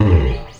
FRET ROLL, Electric Basses; E-MU Producer Series Vol. 8 – Platinum Phatt

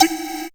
percussion, Dance Synths; Mad Kit

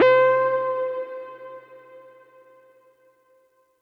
Synth C, Synth Prophet One Shots; Electronisounds

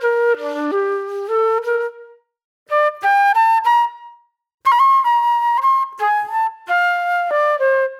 Reflective Flute

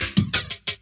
LOOP SD, Drum & Bass, 180 BPM; Ueberschall; Drum & Bass Resonance

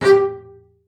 G4, bass spicc; Xsample VOL-5

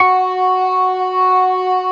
ORGAN SYNTH; InVision Interactive; Keith Emerson

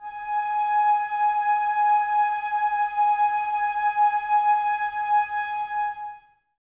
Flute, Woodwind

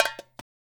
FILL, Percussion Slam; GRUNT; InVision Interactive

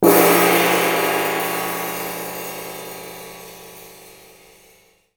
gong; Greytsounds; EXOTIC GONGS

